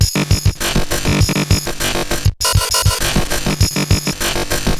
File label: Bent RX17 (100BPM), cyborg beats; musicradar